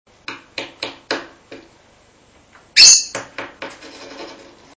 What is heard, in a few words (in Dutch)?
nestplaats, agaporniden